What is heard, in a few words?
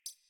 Computer Room Beep Misc